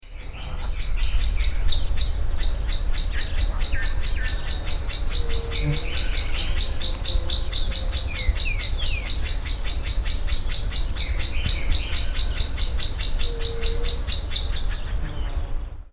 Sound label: Dendrocycla turdinus; EE Jureia; Juquiazinho; Peruibe SP; Brazil